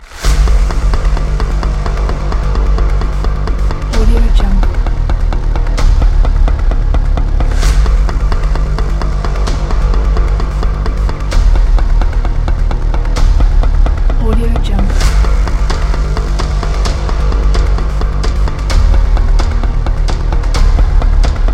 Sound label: epic energetic drums